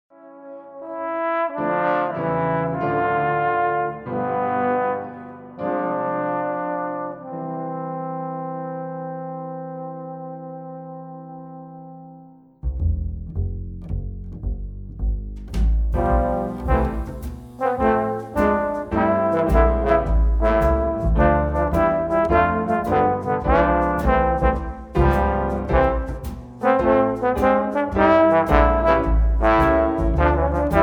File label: 2 TB O Christmas Tree